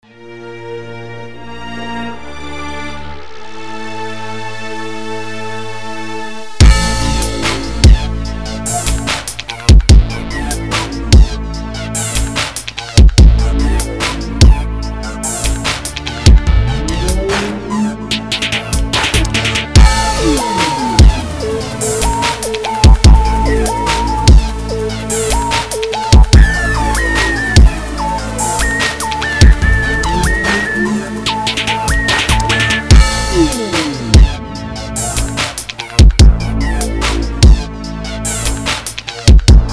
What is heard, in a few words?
73 BPM Instrumental